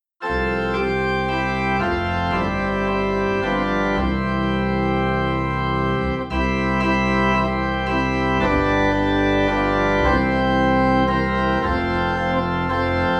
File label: Organ Low in C; Blest Be the Tie That Binds